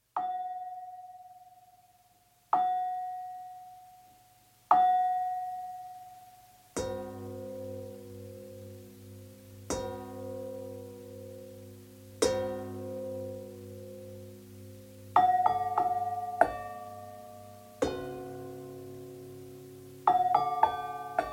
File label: Bell Lyre